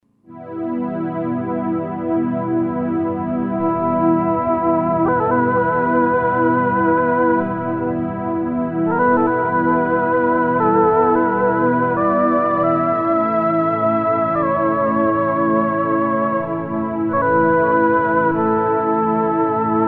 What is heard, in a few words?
Pad - Lead, Roland JV-2080